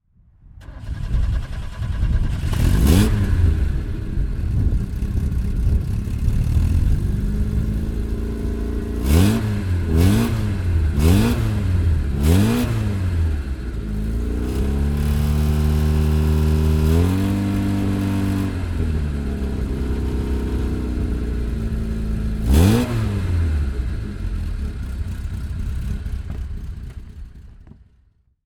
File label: Fiat 128 Sport 1300 SL; Fiat 128 Coupe 1300 SL